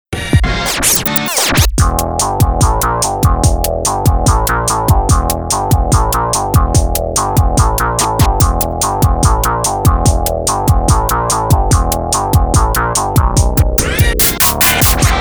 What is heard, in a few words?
Classic Rock